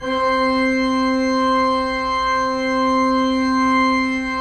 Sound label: PIPE, 3DOrgans